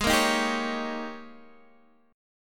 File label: Ab Major 7th Suspended 2nd Suspended 4th Ab Guitar DADGAD; x,11,8,8,10,11